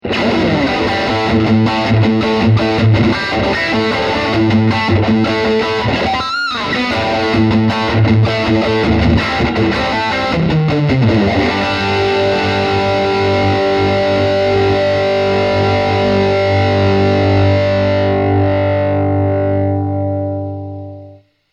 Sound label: Lead UK